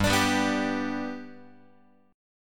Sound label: G Major down Guitar; G strummed; JGuitar